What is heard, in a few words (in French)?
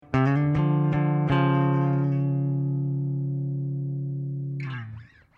clair riff; riff son clair